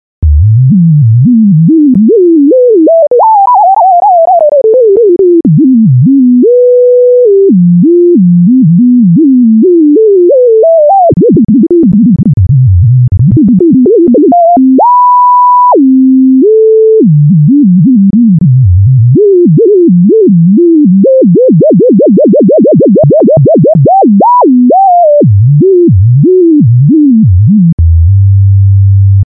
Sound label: legato portamento